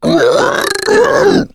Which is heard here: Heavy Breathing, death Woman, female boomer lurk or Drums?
female boomer lurk